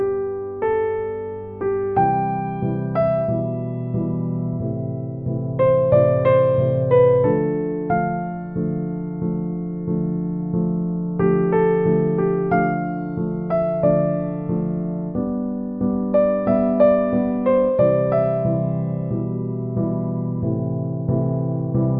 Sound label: background music; digital card